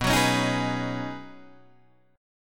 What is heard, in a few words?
B 6th Add 9th B Guitar Open D, chord